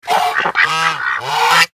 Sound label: horde quack a trice death, mobs